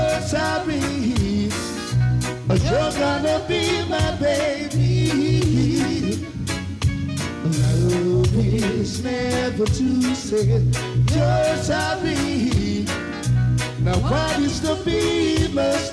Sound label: reggae